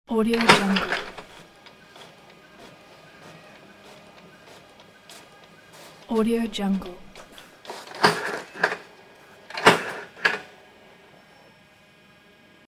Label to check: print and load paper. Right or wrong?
Right